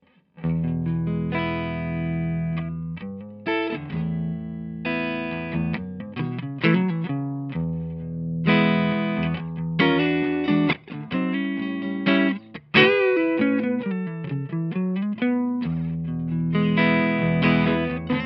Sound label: strat clean